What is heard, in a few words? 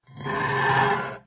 Drag; fa battlemaps